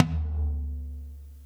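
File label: TOM soft; Zero-G; Chemical Beats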